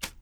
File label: metals; Spectrasonics; Liquid Grooves 1 & 2